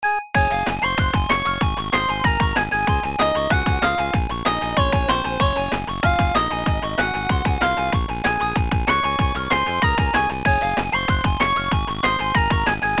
8-bit; Bakuman 3 OP

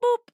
boop

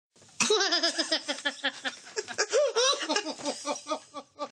Laughing

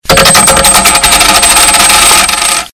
Spin A Coin

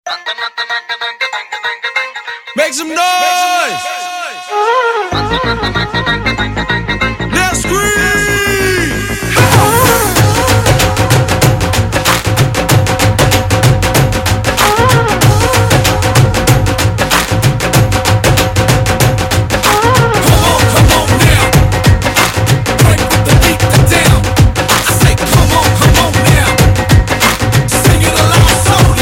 instrument

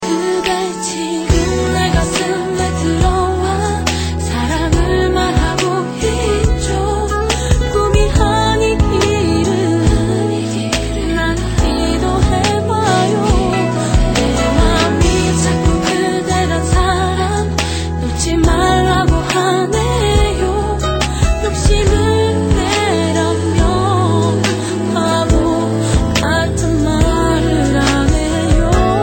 Ring Tone; Full House

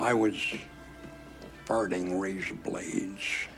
Farting

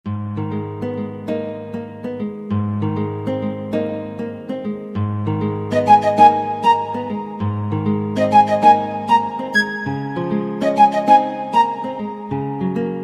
Piano Flute Mix

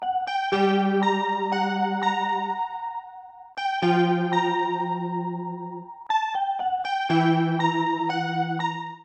Creepy Piano Fear Theme

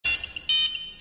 Nokia Sms

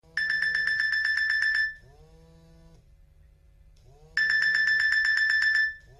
Morning Vibration

